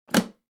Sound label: briefcase lock